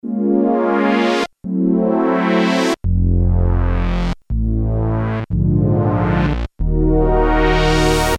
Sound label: Crescendo Fat Brass, Korg Poly-61M